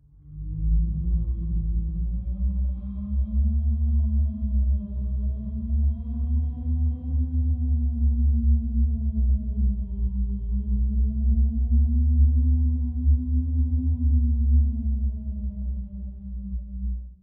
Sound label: turbine failing, Rumbles